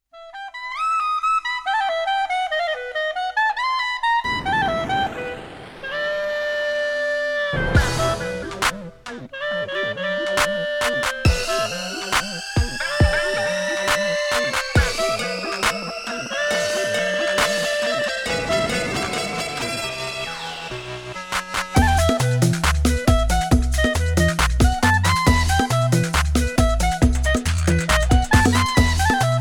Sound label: Dub